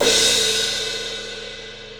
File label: 18 inch HHX legacy crash, cymbal; Sabian